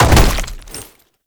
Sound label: Land Heavy Rock, movement; Warframe; Uriel